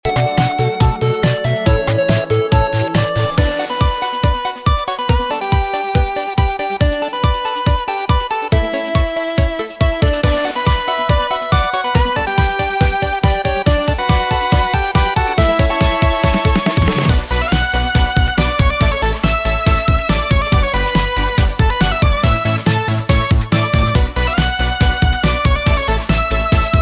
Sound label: 8-bit; Prowler; tetraq